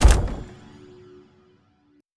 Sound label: Level Editor Object Place Wood; Aragami